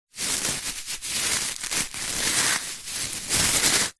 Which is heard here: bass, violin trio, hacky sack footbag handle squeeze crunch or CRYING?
hacky sack footbag handle squeeze crunch